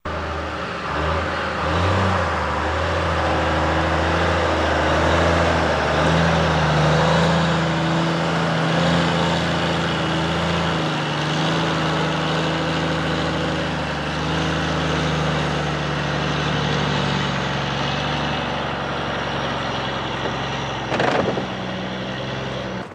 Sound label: Truck Diesel Idl Away